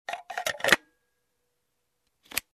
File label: Removing lens cover with shutter sound